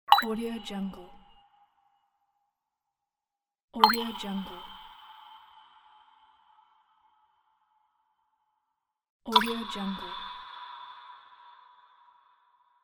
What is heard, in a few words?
drop